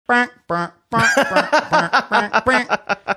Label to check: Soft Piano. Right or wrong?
Wrong